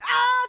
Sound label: Ooh, Yamaha DD-35